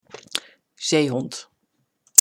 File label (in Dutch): Zeehond